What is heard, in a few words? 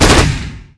flack cannon; camerman titan minigun